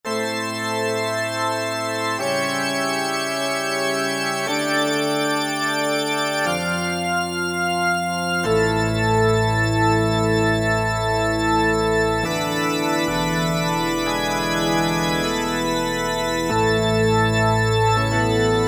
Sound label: Pipe Organ, Korg MSC-9S Organ